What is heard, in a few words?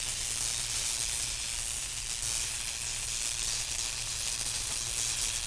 cable sparks; electrical sparks